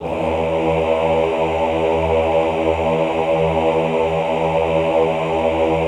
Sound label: AHH E1 L, Classical Choir; Best Service; Extended Classical Choir; AHH LO+HI LL